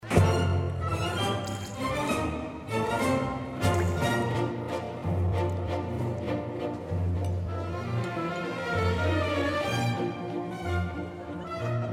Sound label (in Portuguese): valsa